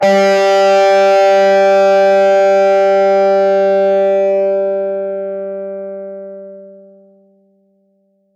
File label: PLK DIST G3, Acoustic and Electric, Plucked; Polyend; Simulacrum Sounds; 1 Shots